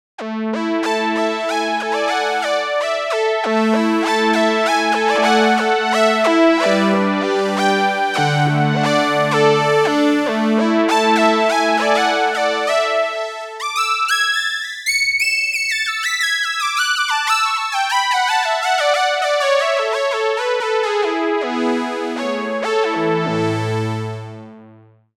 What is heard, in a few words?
nice eighties synth; briandc synthv1